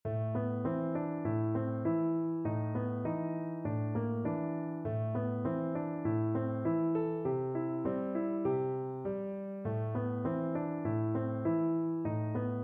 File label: piano